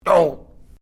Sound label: Created Creature Small Ouch, Alien Sound Effects